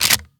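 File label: rechamber, shotgun; reloads; iw8; romeo870; gmod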